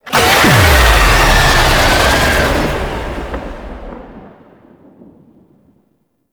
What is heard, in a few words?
Boss Vocal Transition Stinger; Enemies; Vocals; Warframe; Oraxia